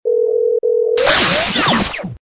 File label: Stinger; STINGERS